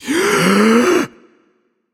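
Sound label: nonverbal breath; voicepack; smite; merlin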